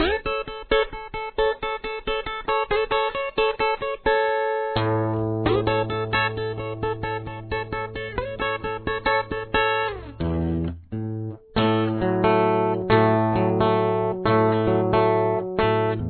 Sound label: chorus, guitar